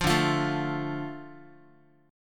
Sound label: D Minor D Guitar; Dm strummed; 8,10,8,7,x,x; JGuitar